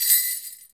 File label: BEL, Orchestral Perc; Roland LCDP03